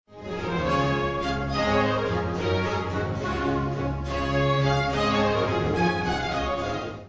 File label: bourree